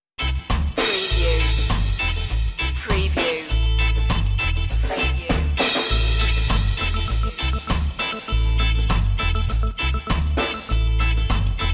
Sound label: rock steady rockin